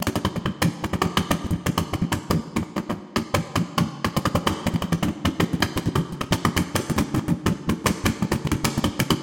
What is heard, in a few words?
Wet tapdancing music